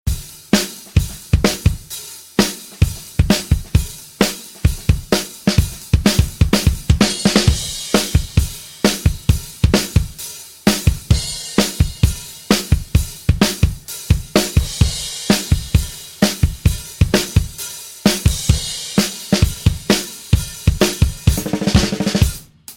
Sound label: Drums Dry